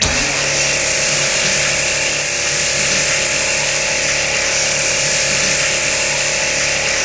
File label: laser beam; Source Engine; Portal 2